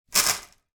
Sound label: handful of raw dry spaghetti put down on table